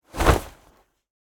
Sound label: Whoosh Cloth Leather Fight; SoundBits; Just Whoosh 3 Whoosh Essentials